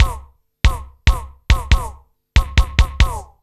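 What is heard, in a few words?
KICK ELECTRO 140, Techno Drum; Techno2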